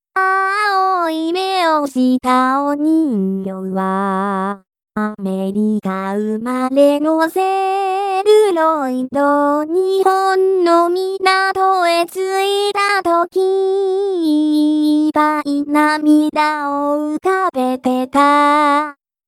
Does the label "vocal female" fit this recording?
Yes